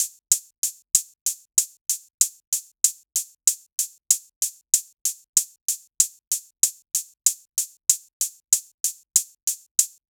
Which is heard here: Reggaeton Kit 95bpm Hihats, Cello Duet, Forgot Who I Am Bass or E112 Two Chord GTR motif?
Reggaeton Kit 95bpm Hihats